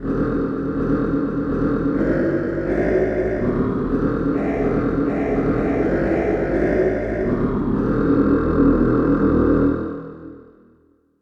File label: ahh choir bass